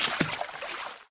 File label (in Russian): vsplesk nebolshoj volni